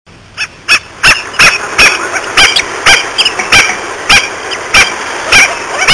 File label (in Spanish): perrito; lampa; municipalidad de lampa